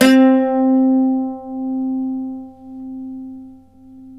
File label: c+3, Mandolin; Terkelsen